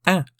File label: Chorus hurt